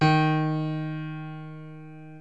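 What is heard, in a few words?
piano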